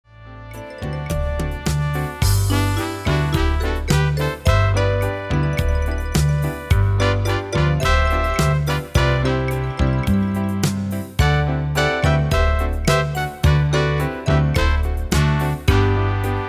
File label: rumba